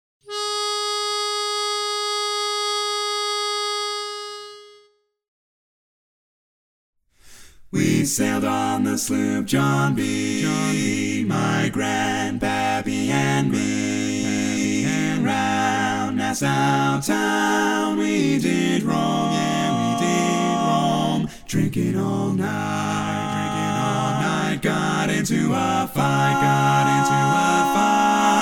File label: Sloop John B Full mix